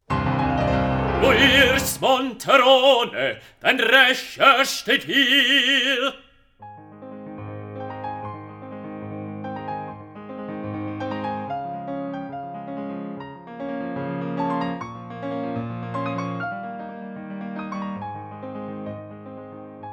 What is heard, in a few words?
instrumental; rigoletto; ja nun schlaegt sie die stunde der rache